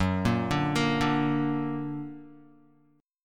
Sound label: Gb Augmented Guitar; 2,1,0,3,2,x; Gb+ arpeggiated